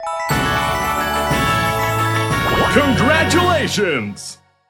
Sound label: Alert; Dolph Dive